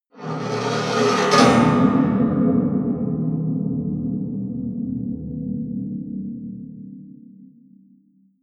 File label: percussion